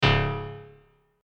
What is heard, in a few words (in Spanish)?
Fallar Disparo; SFX Gameplay 3